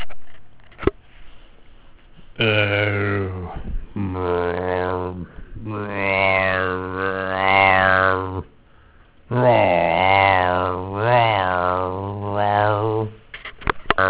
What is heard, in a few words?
Growling Raw; scary sound effects; making scary sound effects with sony's sound forge audio studio